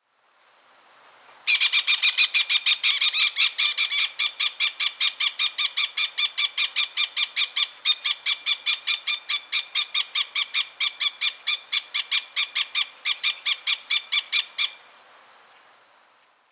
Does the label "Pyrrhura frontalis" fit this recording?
Yes